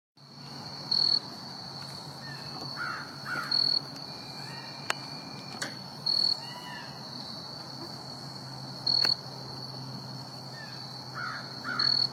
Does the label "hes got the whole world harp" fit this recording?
No